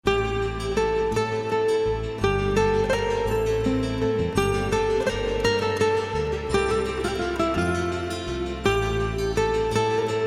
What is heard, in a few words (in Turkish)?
alaturka gitar